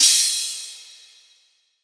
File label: Crash